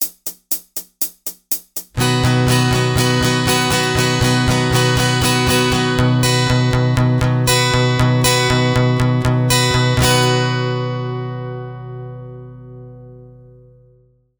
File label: Bass-Treble Strumming, capo 4; Ultimate guide to strumming book